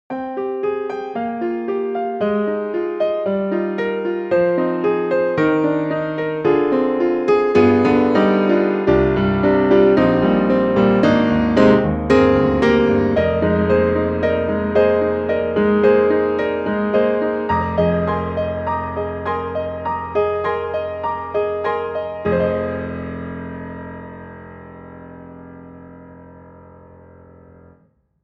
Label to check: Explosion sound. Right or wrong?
Wrong